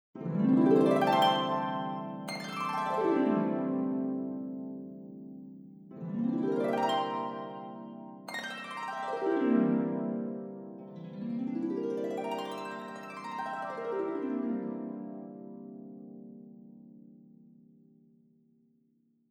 percussioni arpa

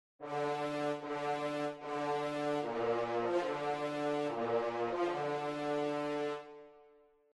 marcia imperiale

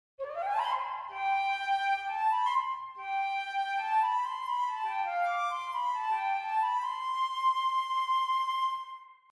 flauto

